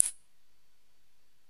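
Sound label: percussion, ambient, 160; eJay; Drum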